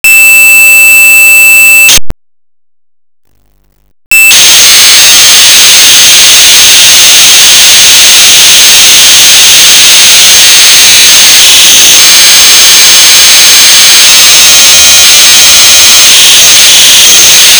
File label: Turbo ROM WAV, Cassette Files